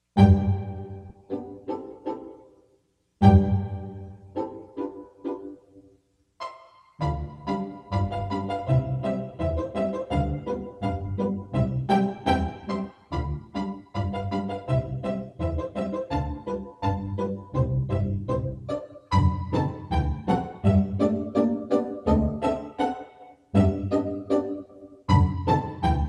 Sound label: Pizzicato Polka